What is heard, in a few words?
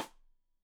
Side Stick Stereo; Deeply Sampled Drums; SMDrum